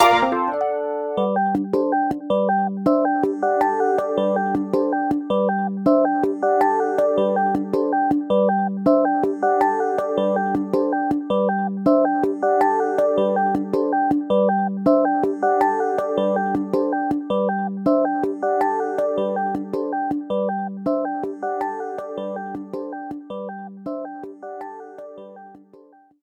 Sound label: BGM Finish; clubhouse games 51 worldwide classics 2020 switch gamerip